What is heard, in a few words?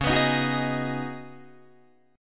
C sharp 7th Suspended 2nd Suspended 4th C sharp down Guitar DADGAD